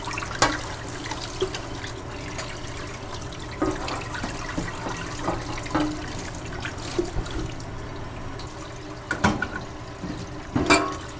Doing Dishes, kitchen

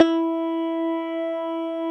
FM ZAPP E4

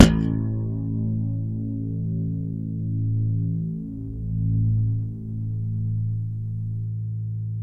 WARWICK BASS; Masterbits CLIMAX 7

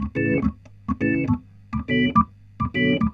Roots Reggae, B-3; Sounds Good

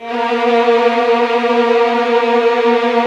HF TRLL A#3, Violin; Q Up Arts; Denny Jaeger Master Violin Library; PERFORMANCE

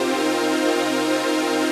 CHRD PAD; Best Service; XX Large Pads; Sample CDs

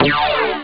wasp; Amiga Soundtracker Sample Packs

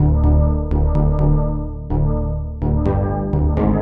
Lead

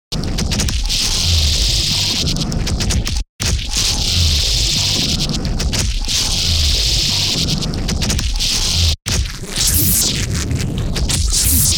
boop

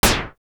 Moog SD; Moog Modular55

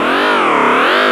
Odd Flange C2, FX, Club Techno

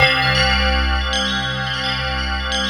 C2, Synth; FANTA90H; Big Fish Audio; Synth City; PHANTASK

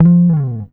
GTR TRIK, Jazzistic; Univers Sons

